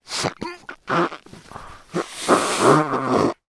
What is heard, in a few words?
human blow nose into tissue